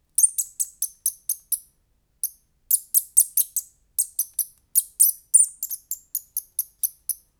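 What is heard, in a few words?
Lasiopodomys brandtii adult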